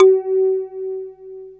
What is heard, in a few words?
Click Bass high; instrumente